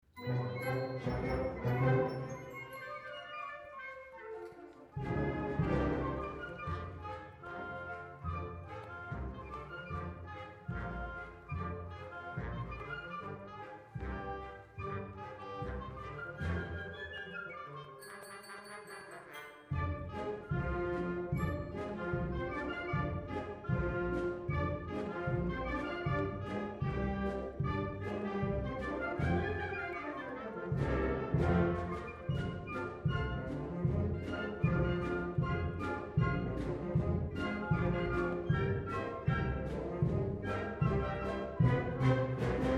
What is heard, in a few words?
Jazz Pizzicato